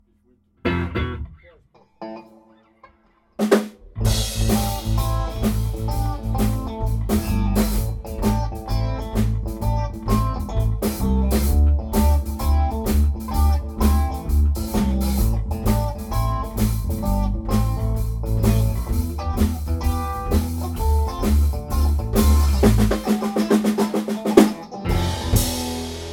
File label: Blues